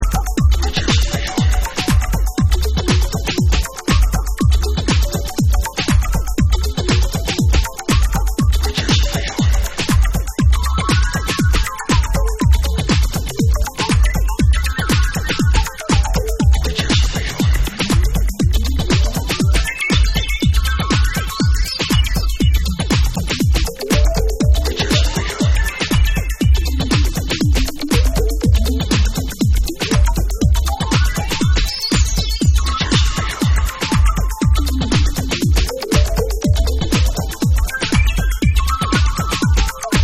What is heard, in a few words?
BONUS BEATS